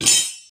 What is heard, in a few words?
metal